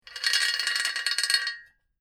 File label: Metal Chain Pulley Rattling Chains; Chain sounds